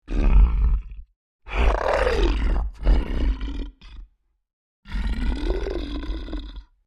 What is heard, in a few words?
Creature Large Vocalizations; Monsters; Horror